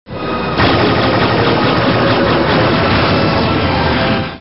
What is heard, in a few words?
phaser